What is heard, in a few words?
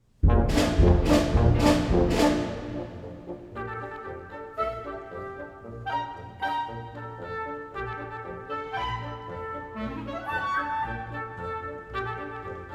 suite for small orchestra no 2 polka